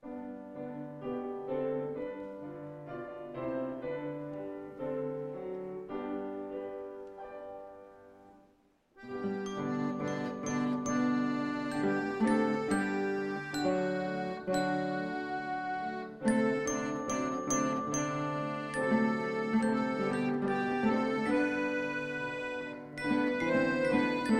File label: Instrumental